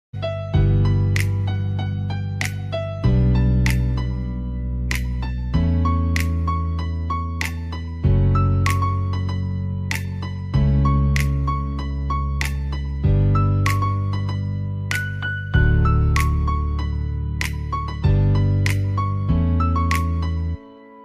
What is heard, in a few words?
Instrumental Ringtone